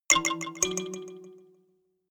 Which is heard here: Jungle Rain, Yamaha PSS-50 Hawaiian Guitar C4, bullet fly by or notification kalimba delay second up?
notification kalimba delay second up